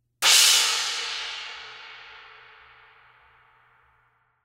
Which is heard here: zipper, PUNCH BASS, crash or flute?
crash